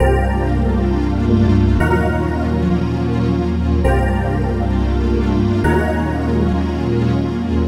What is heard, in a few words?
Synth Chords Dry